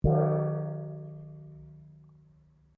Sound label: GONG 8 INCH P, Cheap brass gong; Gong Chau; Cymbals; Sound Effects:Musical; Foundation3